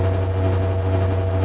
fan

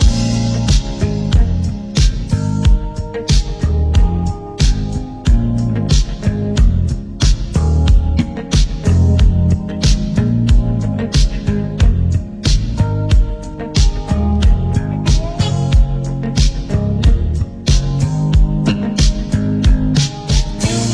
nocturnal city streets, music; crimecity

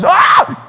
scream

